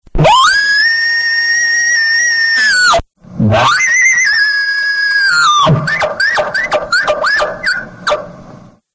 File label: elk bull bugle